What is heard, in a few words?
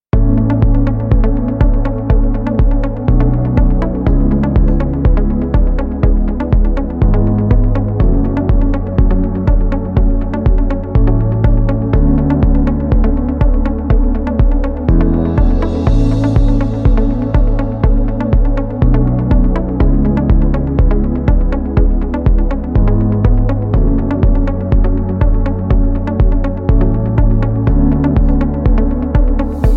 music sound